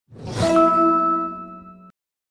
Power On; Samsung Galaxy Note 4 SM-N910P

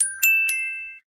music message, notifications; Samsung GT-i7680; cmcc

